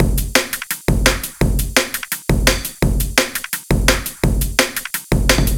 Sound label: 170 Elephant Dirty